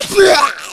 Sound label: Tongue Hit miss; Smoker; zombie plague; cstrike